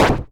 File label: 8 Bit Slam